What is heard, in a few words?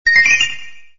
Bell